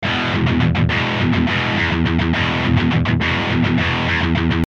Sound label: guitar 600hz